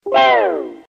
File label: Wrong